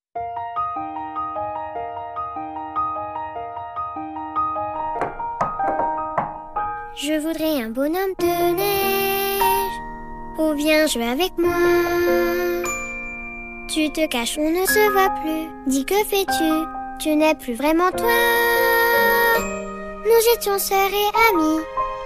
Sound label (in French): version chantee